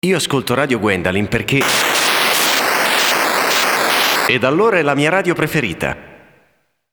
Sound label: Jingle